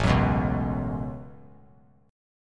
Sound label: A 6th Add 9th A down, chord; A6add9; strummed